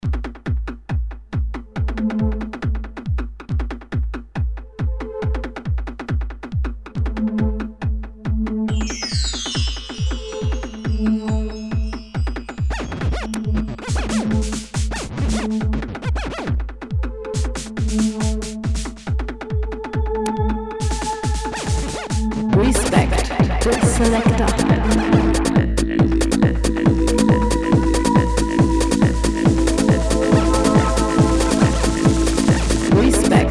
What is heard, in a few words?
Scratch Intro